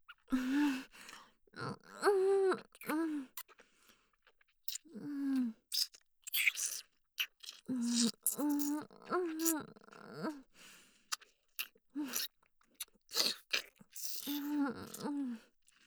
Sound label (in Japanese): フェラ音(激しめ)